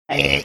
making buzzer sound

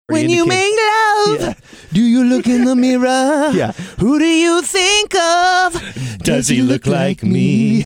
singing

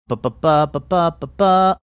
singing b b ba bba bba

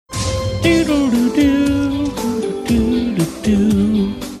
singing along with theme

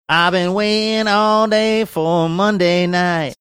singing i've been waitin all day for monday night

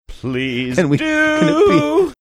singing please do

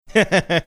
chuckling

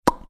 making mouth popping sound

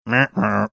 mimicking uh oh drop

